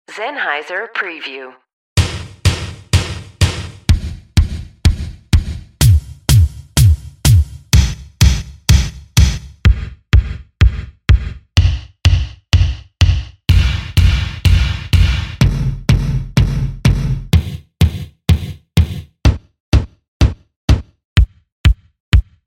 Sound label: 80's Mega Kicks; Zenhiser